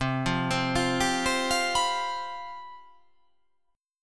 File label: C Major 7th Suspended 4th, down